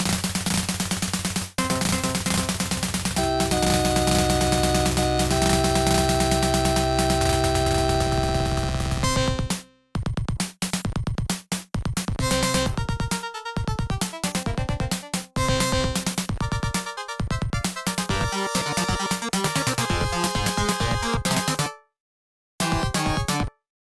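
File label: background music; Last Duel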